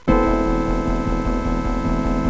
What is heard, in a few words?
organ